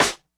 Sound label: snare; funk